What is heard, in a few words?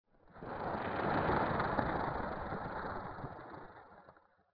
leaves in wind, ambience; dod